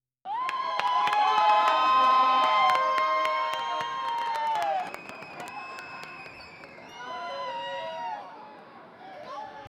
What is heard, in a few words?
massive crowd cheering, fireworks